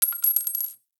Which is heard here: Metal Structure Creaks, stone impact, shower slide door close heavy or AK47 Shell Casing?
AK47 Shell Casing